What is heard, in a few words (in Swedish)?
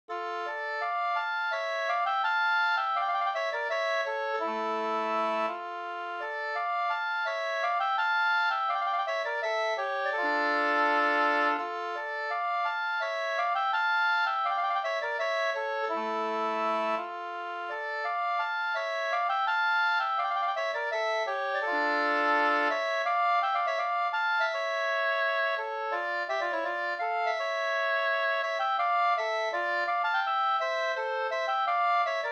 skanklat